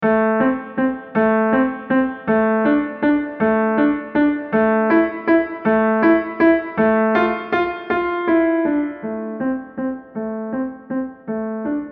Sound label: Halloween Waltz